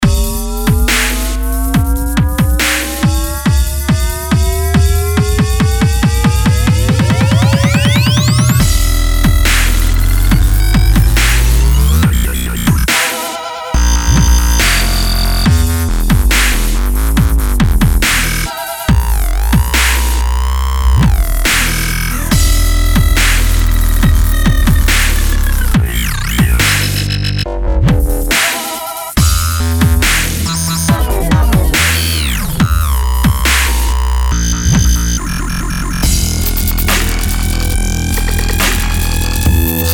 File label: Dubstep; Dubstep Massive 1 Nebulla